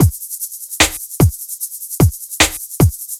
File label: Beat, Futurist Drum & Bass; Drums & Percussion